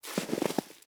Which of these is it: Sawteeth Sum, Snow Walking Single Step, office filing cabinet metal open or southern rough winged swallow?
Snow Walking Single Step